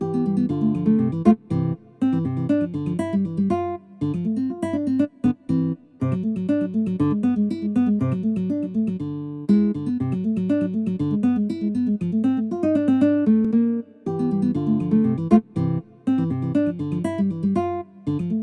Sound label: Baroque Acoustic